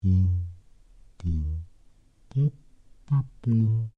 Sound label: Created Creature Large Vocalise, Alien Sound Effects; Created creatures, vocalisations and pretend speech